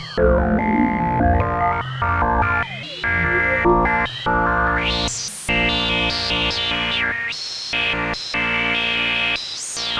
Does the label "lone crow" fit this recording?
No